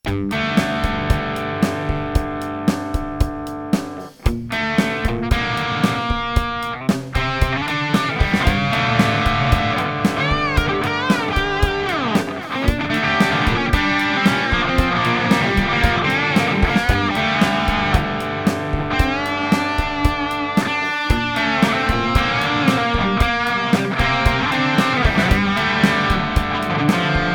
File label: Mooer Prime P2 Hair Metal Soft Part